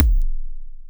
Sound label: kickdrum